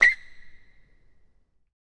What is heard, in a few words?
Basketball Court